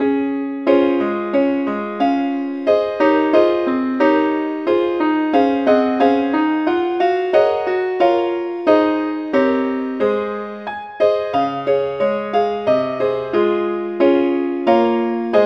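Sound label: keyboard only, key C sharp; saintthomas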